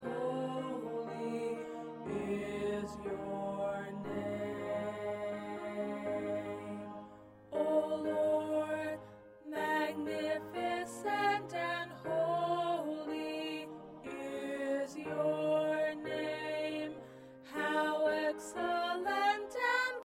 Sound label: Soprano, choir